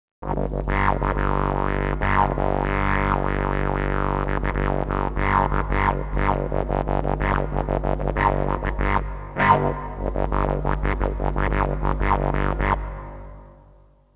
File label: digeri